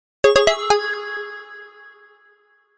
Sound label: Pluck Message